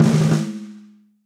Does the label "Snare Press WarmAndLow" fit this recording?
Yes